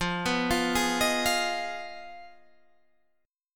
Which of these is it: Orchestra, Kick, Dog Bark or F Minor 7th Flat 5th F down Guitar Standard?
F Minor 7th Flat 5th F down Guitar Standard